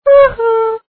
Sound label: laugh; habilidade